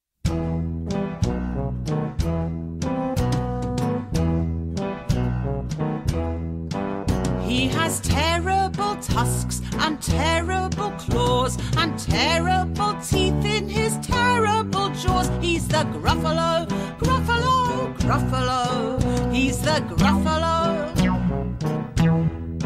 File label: Vocal